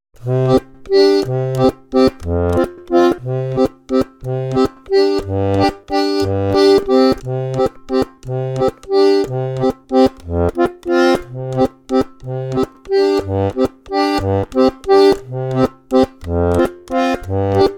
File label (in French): diato bass